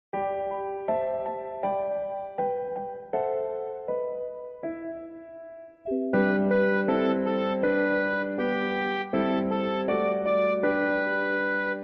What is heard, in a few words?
Karaoke